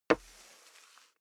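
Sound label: step dress boots wood parquet slide long, footsteps; schritte; menschen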